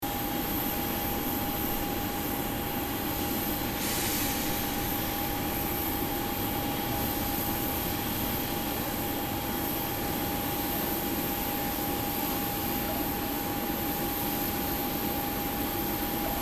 tarmac

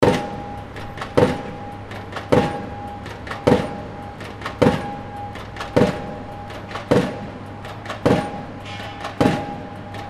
pile driver

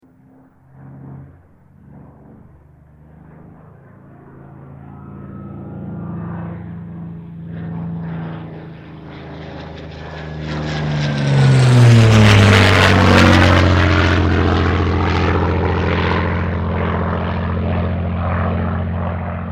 aircraft supermarine spitfire mk-xvi loop; 1944

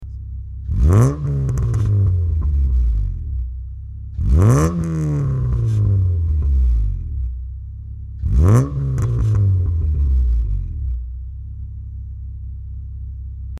lancer exhaust

